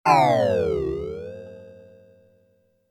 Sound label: Power Down